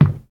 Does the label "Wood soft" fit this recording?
Yes